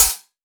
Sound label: hihat a key